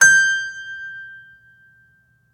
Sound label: Synclavier Keys and Guitar; Synclavier Sampler Library; KEYS AND GUITARS